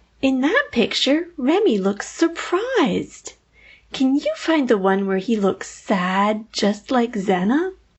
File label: negative feedback